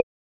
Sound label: Volume, default iPhone sounds; mono; IOS 12